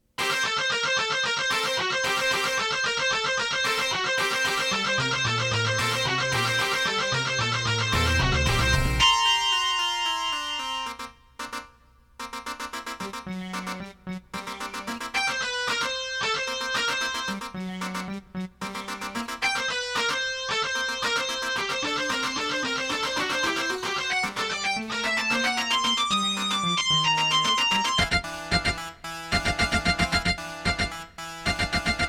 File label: folk dance